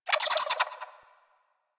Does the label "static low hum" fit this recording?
No